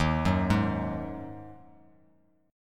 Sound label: Eb Minor Double Flat 5th, Bass Standard, Ebmbb5 chord; JGuitar